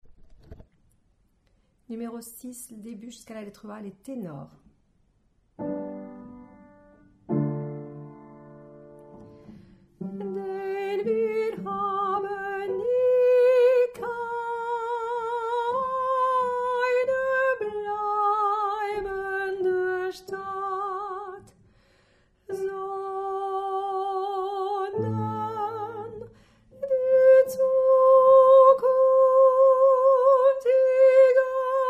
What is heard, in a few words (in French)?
Tenor